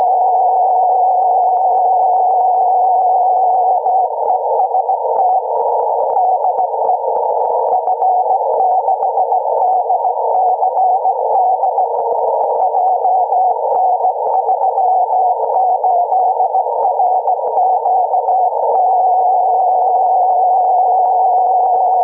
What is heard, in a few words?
122GHz CW; trafic